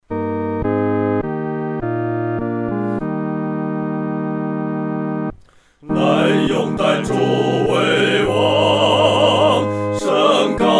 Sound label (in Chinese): 合唱 四声部